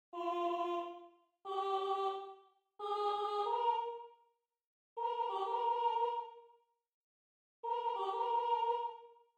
alto; bars 41 - end

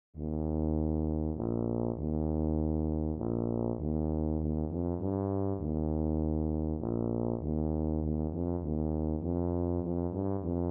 tuba only; theme from the royal fireworks

